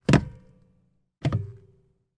van accelerator gas pedal down release engine off mercedes sprinter; transportation; sound effects; sound effects one